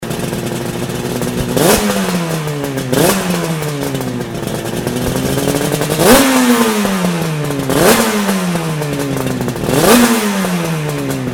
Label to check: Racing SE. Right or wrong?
Right